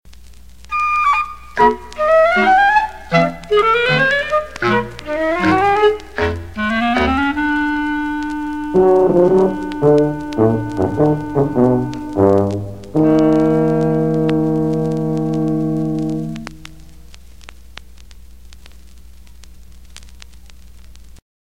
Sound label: Short Bridge, Cartoon Music; Cartoon Music Discs